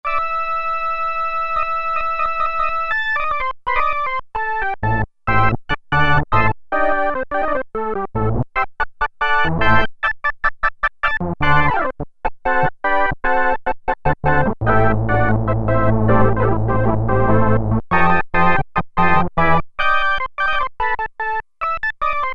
Frontier Organ, Roland Juno-106; Factory Preset

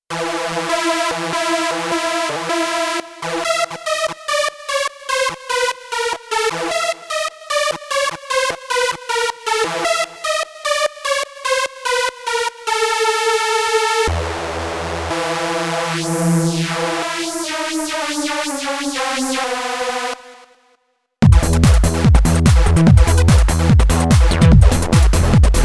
Hard Tune Lead, Korg Radias-R

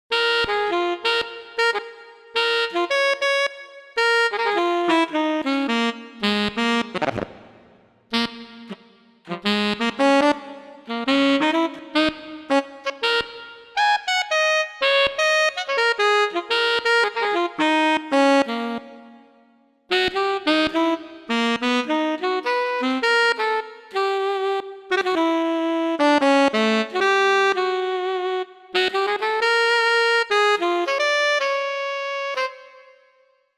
Roland SRX-07 SuperTnr; Roland SRX-07 Ultimate Keys

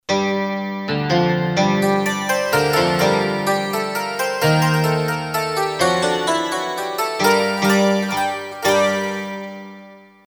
Dulcimer; Yamaha PSR-550; Voice

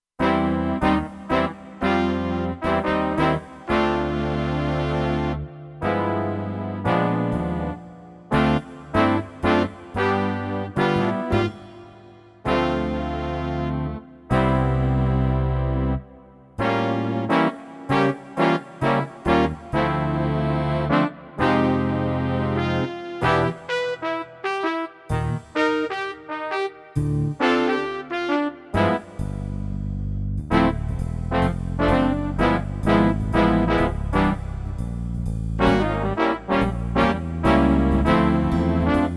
Mellow BigBand, Kurzweil K2500X

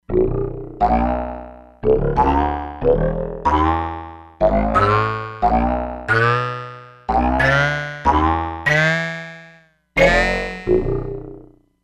Rubber Band, E-mu Proteus-3; Factory patches

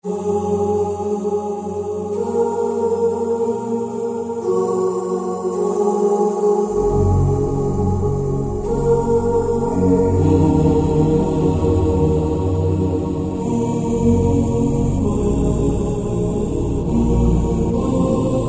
Low World Vox, Kurzweil K2000; Kurzweil K2000 V3; Factory Programs

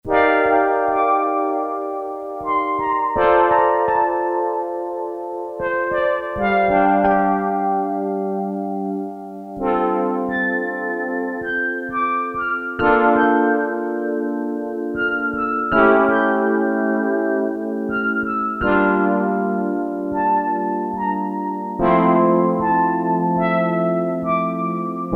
Piano

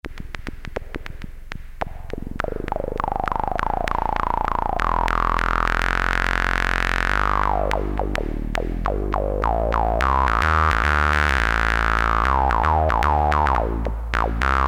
Tuba + wha wha, Farfisa Syntorchestra, Mono

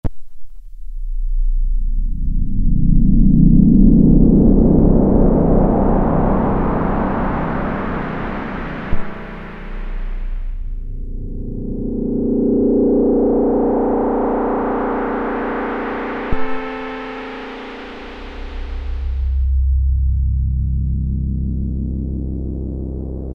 FX Sweep, Roland Juno-106; Factory Preset

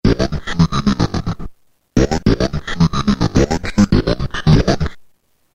Trans Vox; Roland M-DC1

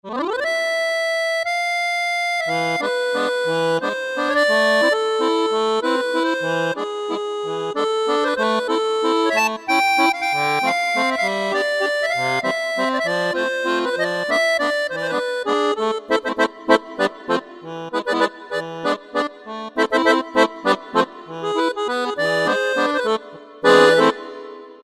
Small Accordion, Yamaha PSR-3000; Factory Voices; Accordion & Harmonica

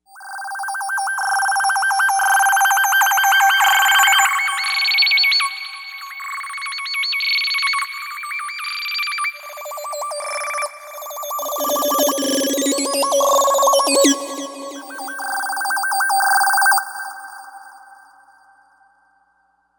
Birds, Novation UltraNova; Factory Patches

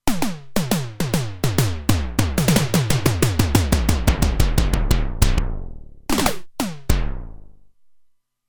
Electro Drums, Roland Alpha Juno-2; Original Sound Chart patches

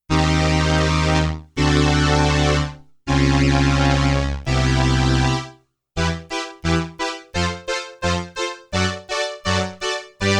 STRINGS I with Ensemble I, Roland RS-202

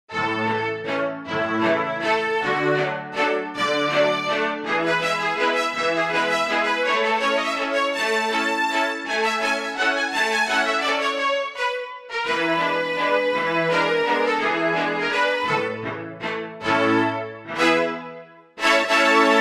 Chamber Str; Korg MSC-1S Variety

